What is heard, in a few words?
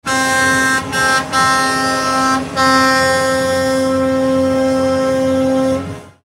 truck horn